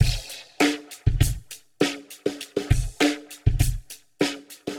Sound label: 100 Splash Ghosts, Warped Live Drums; Loops; Touch Loops; CosmicFunk